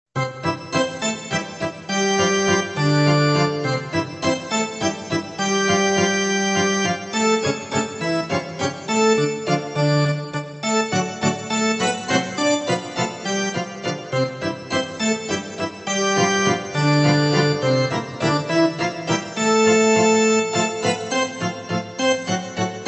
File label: song